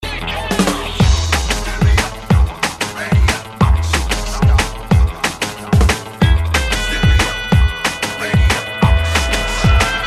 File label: Loud Hip-hop